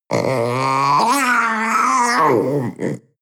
panther young vocal yowl

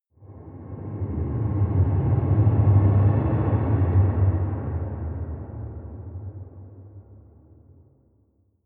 air dark fire

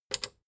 plastic button small latch click disable fast

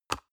paper box small open hard

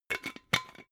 glass bottle massive close cap

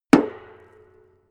metal impact tank small solid

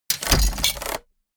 mechanics small rattle

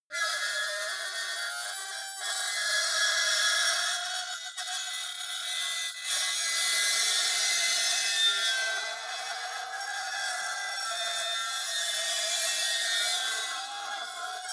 metal lid screech long

